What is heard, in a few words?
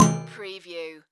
Multimedia Percussion